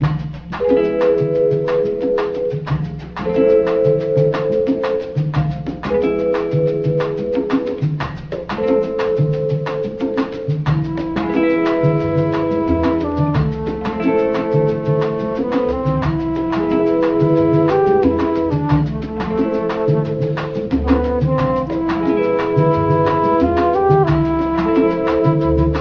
flute, Jazzmatazz loops

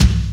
Kicks

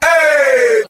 ay group of men, voice ooh ahh

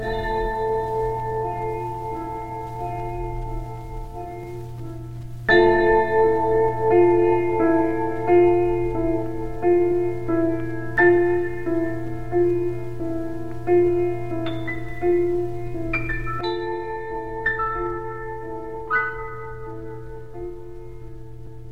piano, Sad

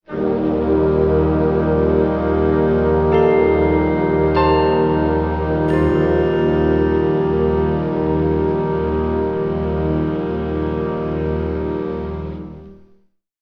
final notes

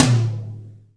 Toms, Real

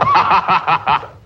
laugh lucifer

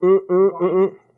mmm mmm mmm-mmm; ooh ahh

